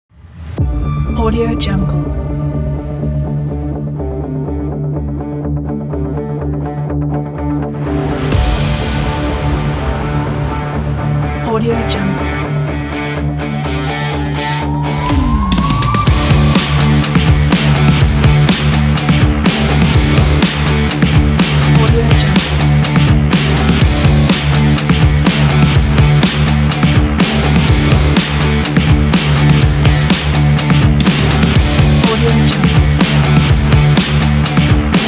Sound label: action sport energetic extreme